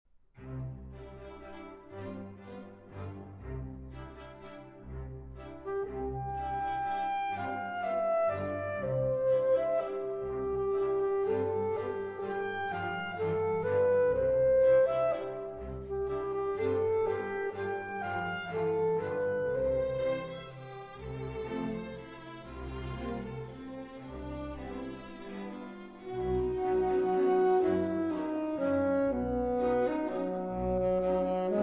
Alto Saxophone Concerto in B-Flat Major Canzonetta